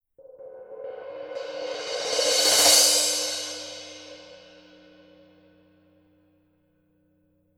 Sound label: Color Sound 900 Black Crash Paiste